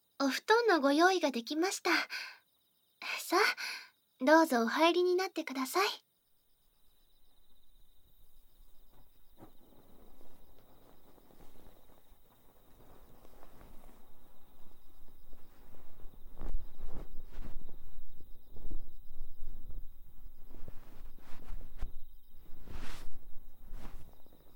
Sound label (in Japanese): 添い寝子守唄, ASMR; 癒しを届けに行くお店 憩恋 メイ